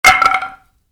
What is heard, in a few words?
Baseball Bat: Dropped Onto Concrete; Sports